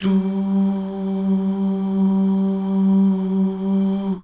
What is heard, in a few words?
DUUH